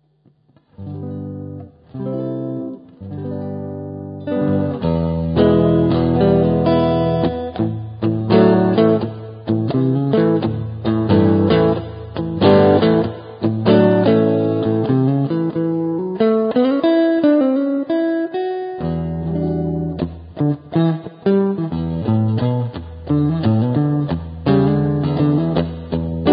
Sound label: plovdiv singlecut